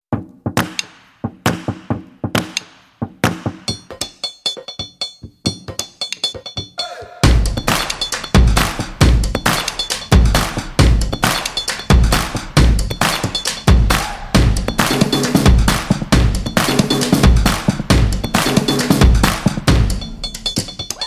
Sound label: Just Drumming and Clapping; MIUI12